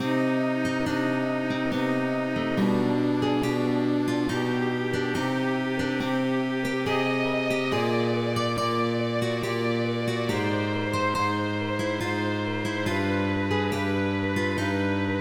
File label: Larghetto, MIDI